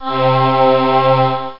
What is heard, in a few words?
octave choir; OctaMED SoundStudio V1; jazzies samples